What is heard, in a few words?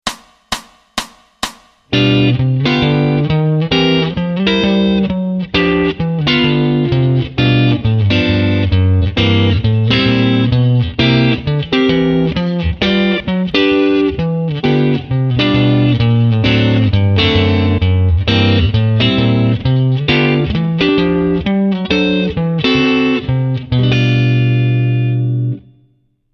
Walk Bass fast